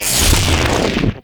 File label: Fire Stop, arc rifle; Infested; Coda Synapse